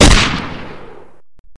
rifle fire

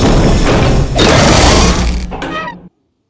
tank tesla deploy

